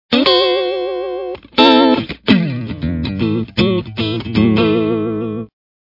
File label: phaser; fast vibrato